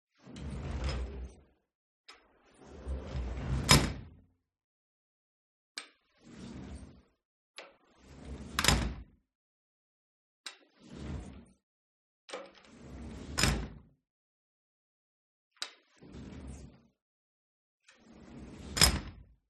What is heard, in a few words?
SLIDING DOOR Metal Living Room Open and Close Soft Close Perspective; gain walkers